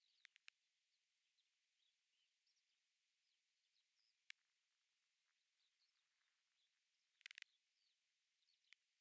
DRONES

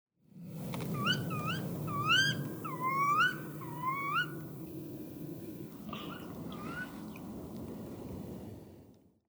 Garrulus glandarius; SETE

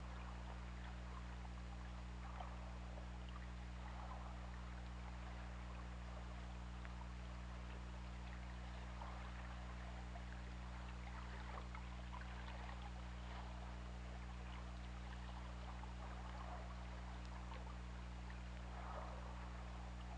Orca; OrcaLab

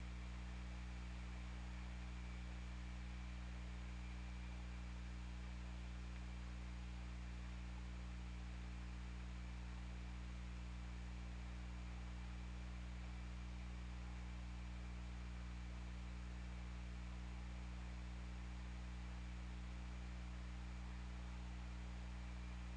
Orca; OrcaLab

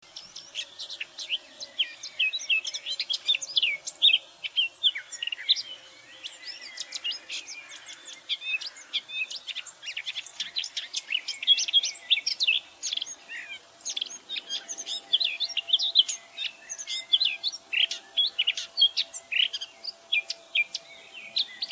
Sylvia atricapilla; SETE

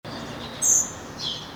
Turdus merula; SETE

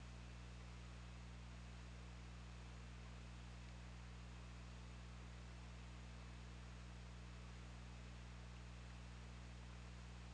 Orca; OrcaLab

